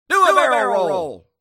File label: barrel roll